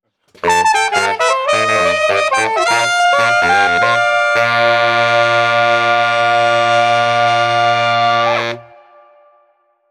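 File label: Street Brass New Orleans Transitions, 95 bpm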